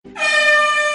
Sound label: horn